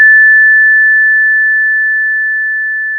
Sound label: Sound of a school bell